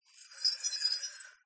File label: foley metal sharp scrape squeak shrill